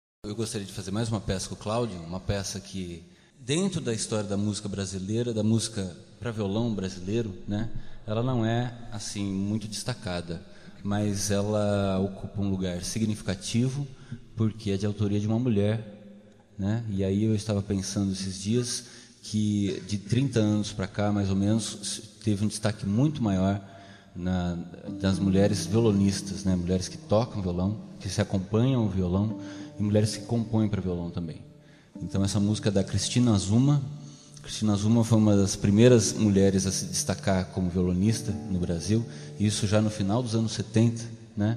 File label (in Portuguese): violao recital